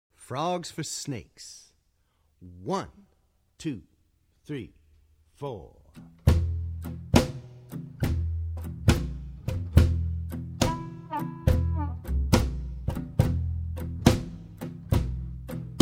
band

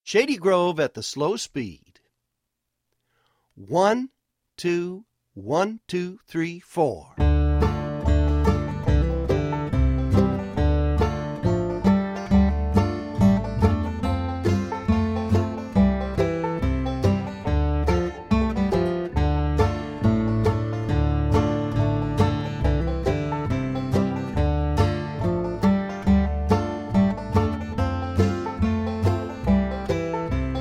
Shady Grove playalong G slow

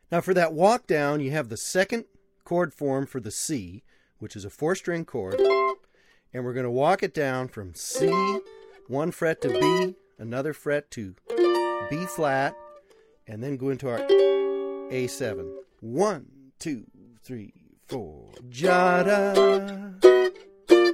chords mix

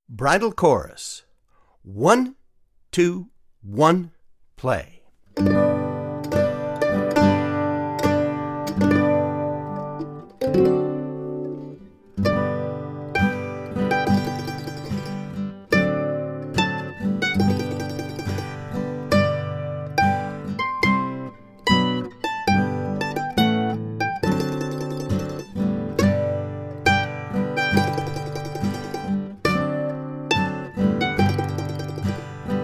Bridal Chorus Wedding Mandolin

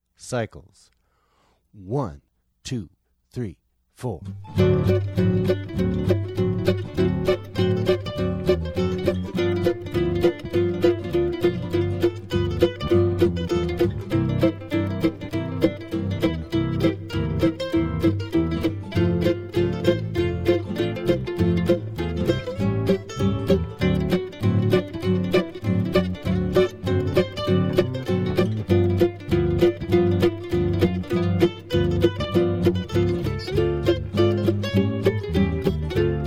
band mix

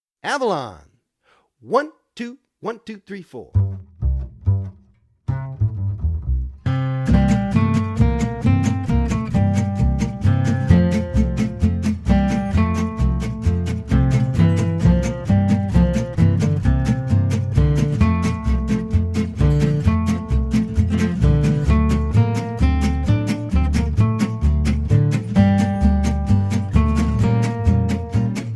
solo

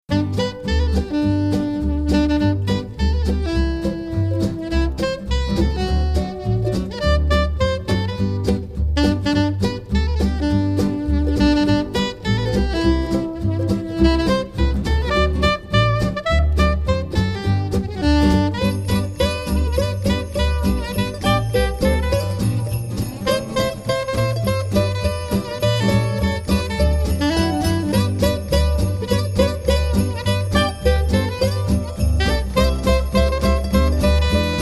Jingle Bells

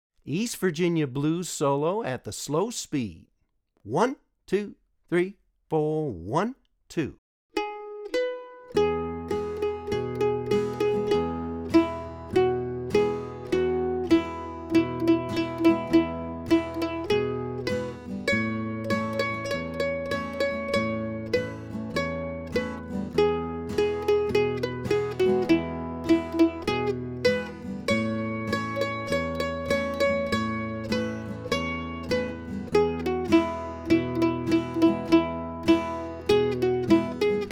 Blues

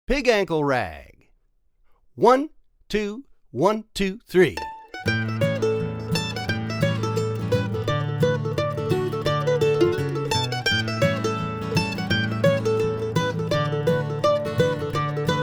Pig Ankle Rag

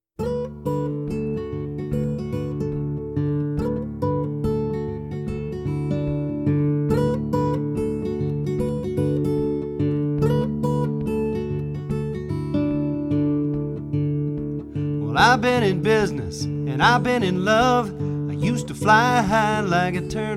Morning Blues

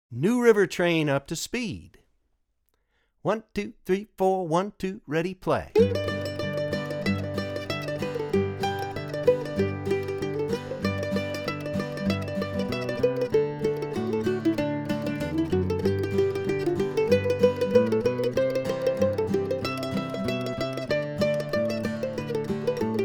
New River Train FST

